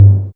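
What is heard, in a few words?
MPC4 big bass drum; USB Soundscan vol.02; Underground Hip Hop; AKAI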